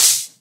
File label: Cymbals HH Open; Vengeance - Essential Clubsounds Vol.2